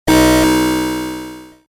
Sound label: Multimedia Internet CD Rom Flash Buttons Electronic